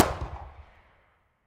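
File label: firework launch into the sky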